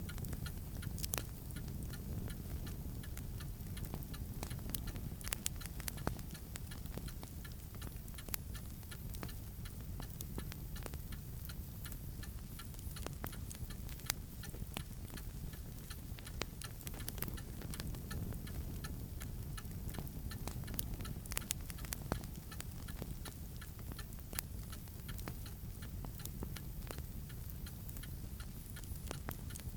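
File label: Scottish cottage interior morning; the 39 steps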